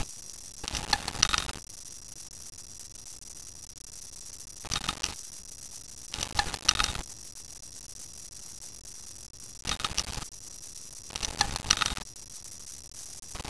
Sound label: clicking hdd low noise; inspiron 4000